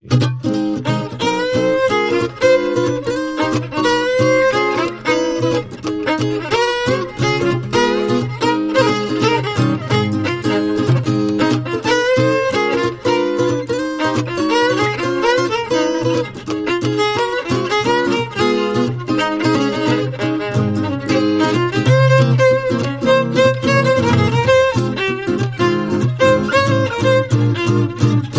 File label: Steel Swinging